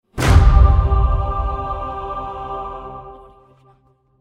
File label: Region Unlocked